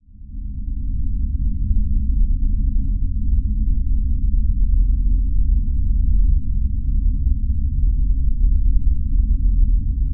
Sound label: Drone Deep Pulsing Power Source Tonal; Production Element Imaging; AdobeCC